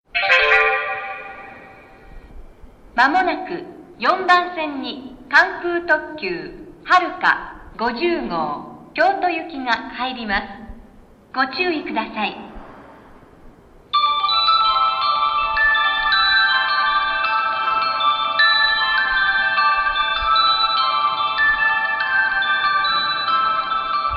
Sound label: japan train